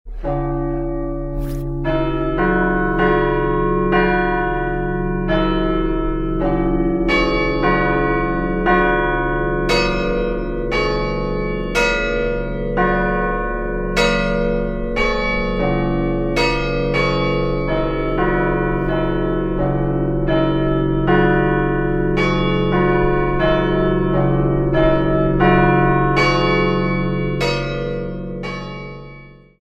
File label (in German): glockenspiel unisono; grengiols